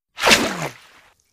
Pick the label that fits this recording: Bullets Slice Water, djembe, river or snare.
Bullets Slice Water